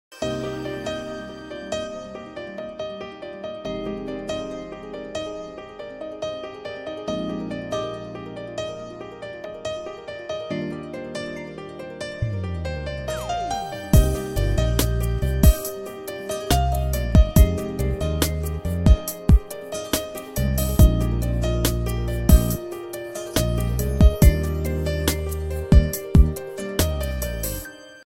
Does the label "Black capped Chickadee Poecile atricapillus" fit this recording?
No